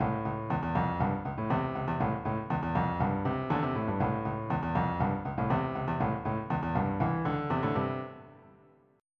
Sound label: Funk